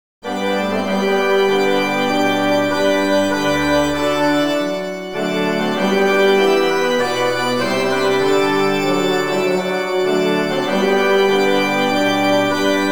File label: Tr Hr Trb Organ, Quarter note = 98